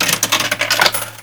coin

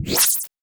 Sci fi whoosh

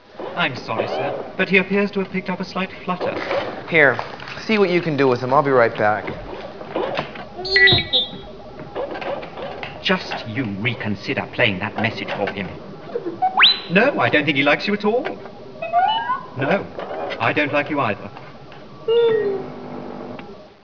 slight flutter; star wars

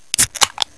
can pop

